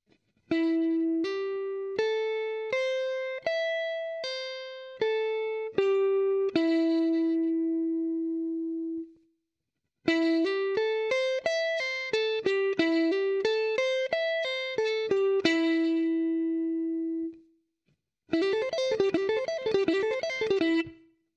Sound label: Sweep Lick A Minor 7th 3-String